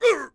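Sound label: Archer Attack Vox; Civ4 warlords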